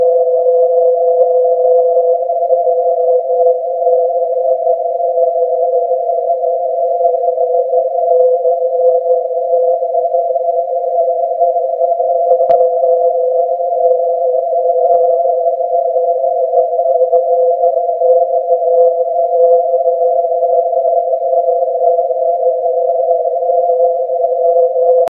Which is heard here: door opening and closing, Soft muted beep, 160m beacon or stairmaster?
160m beacon